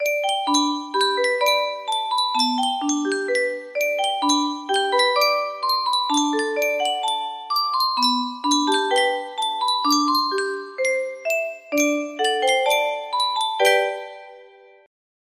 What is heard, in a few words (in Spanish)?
yunsheng caja de musica, music box melody